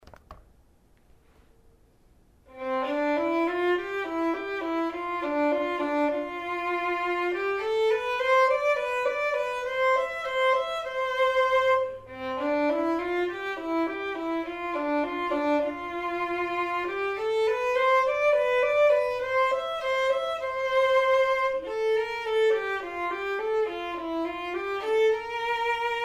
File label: perf vln